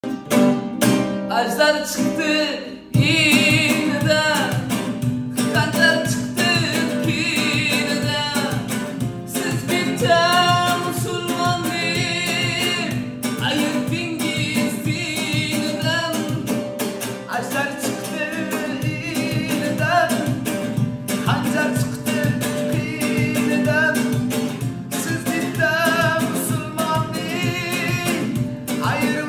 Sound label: guitar cover